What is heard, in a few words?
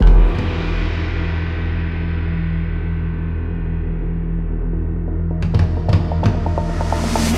Boss Battle First Run Intro; Glitch's Trip